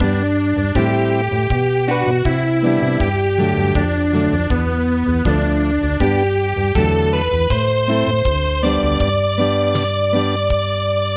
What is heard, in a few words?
Acoustic; Aegukga